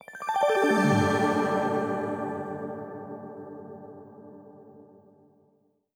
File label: Level End; sayonara wild hearts 2019 gamerip